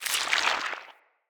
Root Cave Mushroom Squish; Ambience; Props; Neathuns Root Cave

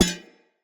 Shield Foley Hit; Weapons; Enemy; Shields; Sinecure Shield

